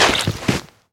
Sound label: zomb body impact; kfsounds; css